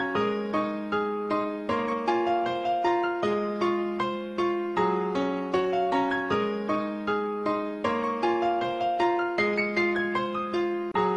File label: Piano